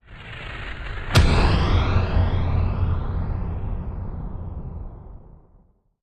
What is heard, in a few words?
Rumble And Big Impact Sweep